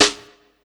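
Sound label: SD -L, snare drum, Drum & Bass; Ueberschall; Drum & Bass Resonance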